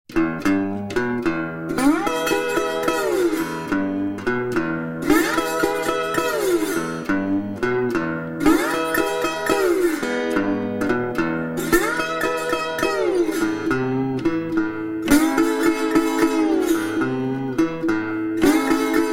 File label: blues acoustic Delta Blues